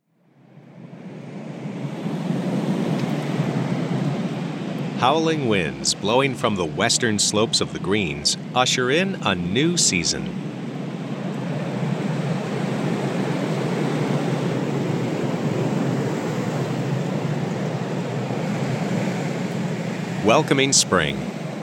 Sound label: spring wind; sonic; content of the year competition radio station entries